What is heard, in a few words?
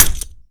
Grenade Bounce; Weapons